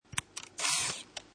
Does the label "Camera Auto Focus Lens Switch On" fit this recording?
Yes